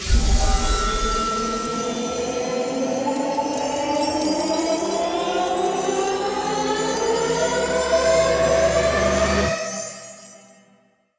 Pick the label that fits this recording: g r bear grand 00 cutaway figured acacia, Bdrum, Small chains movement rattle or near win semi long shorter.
near win semi long shorter